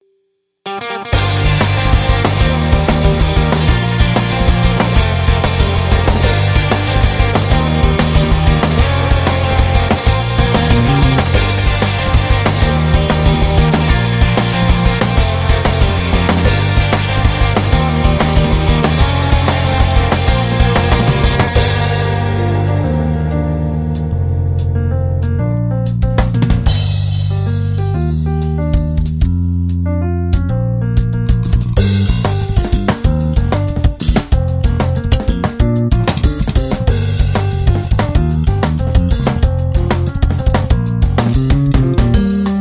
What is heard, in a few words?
bass